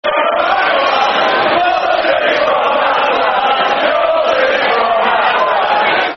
Football Chants (Soccer Songs); Your Suit's From Matalan; Man City Mcfc

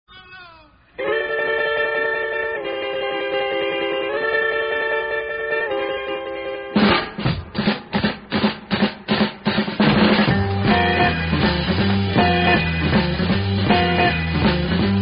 Instrumental; The Boog-A-Loo; hell night

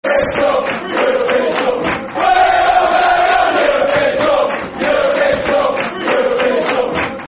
European Tour, Football Chants; Reading; Reading Rfc

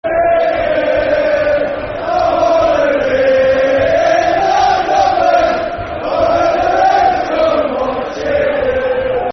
When Red's Go Marching In, Football Chants; Stoke City Scfc

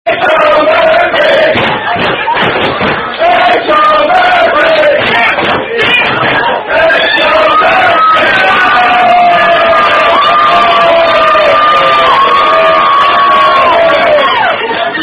Cheer

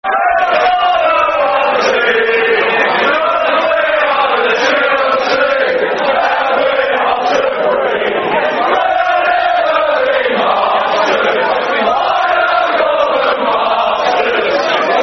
Forever And Ever, Football Chants (Soccer Song); Chelsea Cfc

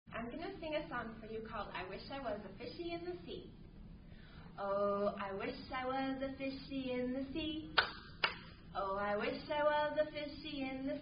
children's song; I Wish I Was A Fishy

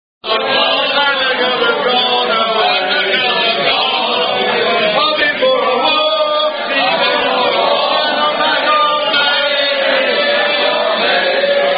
Unknown Hash Song; All The Bars Are Closed

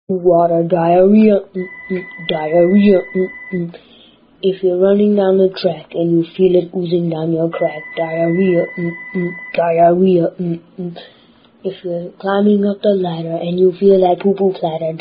Diarrhea Song

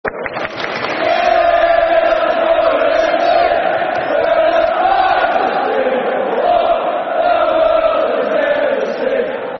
Football Chants (Soccer Songs); Middlesbrough Fc; Middlesbrough Mfc

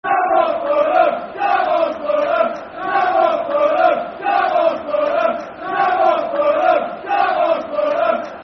Come On Fulham, Football Chant (Soccer Songs)

